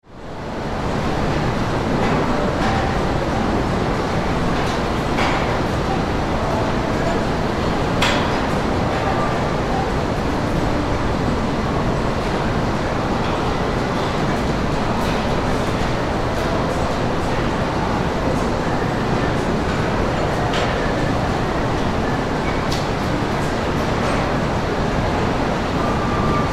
Factory Ambience, Factory, Ambience, Assembly, Plant; 5.1; General, Motors